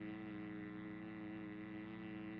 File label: sidling, monsters screamer